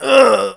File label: pain, male; npc